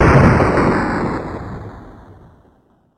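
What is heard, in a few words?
explosion; 爆発音; ちびまる子ちゃん; たまねぎとくちびるウォーズ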